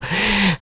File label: MALE SOLO, Heart of Africa; Spectrasonics; Heart of Africa 2; S.MALEDRY